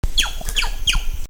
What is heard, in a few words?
Cyanocorax chrysops chyoo